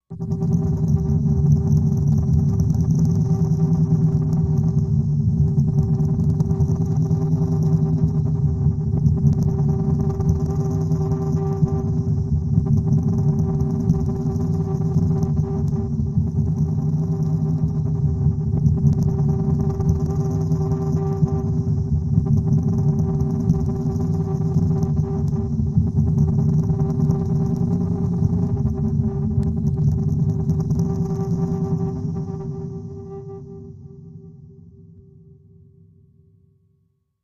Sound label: Tunnel Dream Hollow Feedback Wind Deep Drone; power core hum